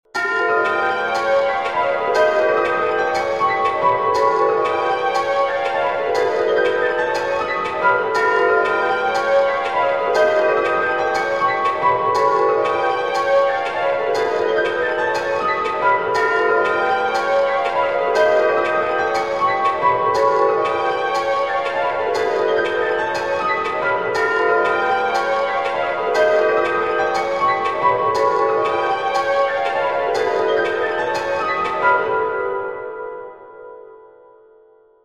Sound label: 6edo groove; Removed low piano and strings, added wood blocks and greater dynamic fluctuation